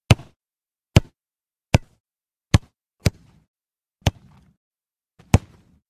Soccer passes street soccer

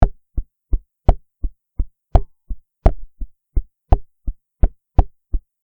Electric guitar muted strings simple rythm

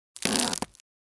Single step on cracking ice

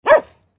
Medium sized dog bark

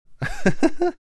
Adult man giggle chuckle; laugh

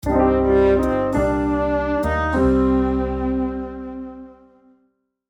Melancholic scene sad emotional sitcom music

